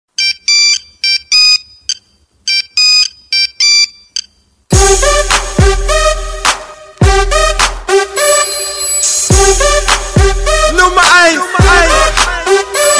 nokia android ringtone

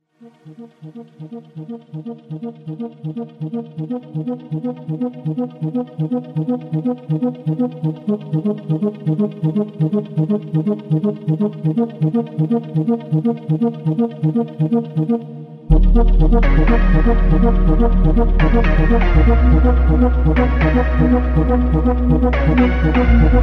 decline ringtone

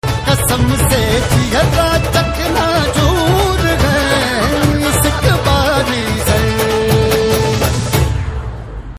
telugu tone ringtone

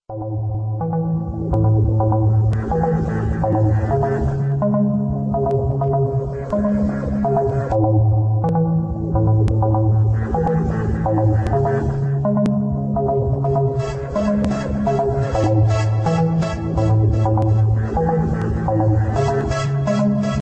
hiphop ringtone

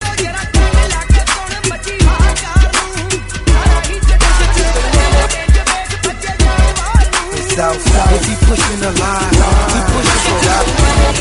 best punjabi dj ringtone

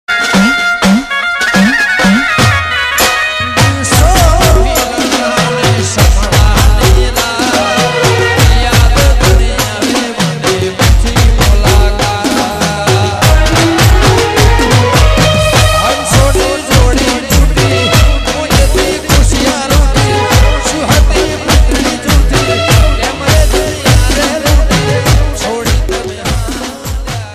sad gujarati ringtone

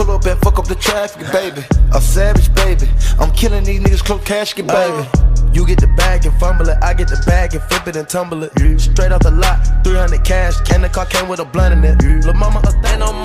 new hip hop ringtone

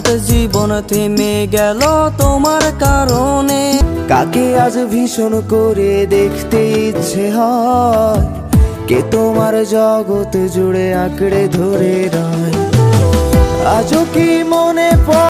bengali ringtone

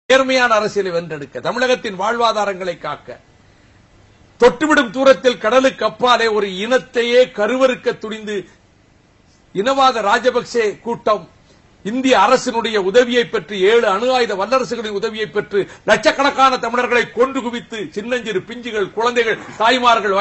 srilankan ring tone

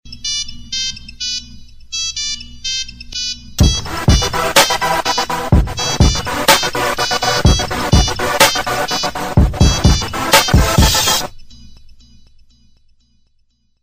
nokia 3 original mix ringtone

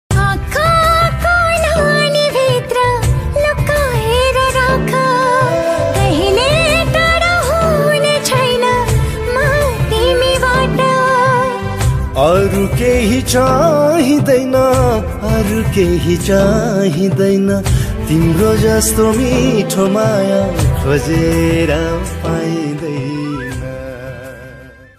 nepali emotional ringtone

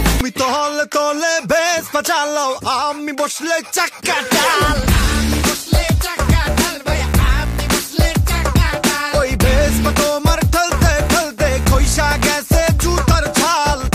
ringtone

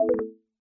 undock ringtone

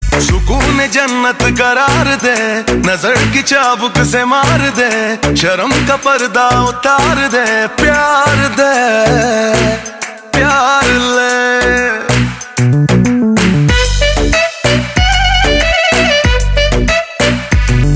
bollywood ringtone

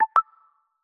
sagano ringtone

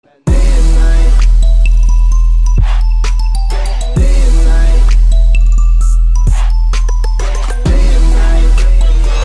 doro mobile sweden ringtone

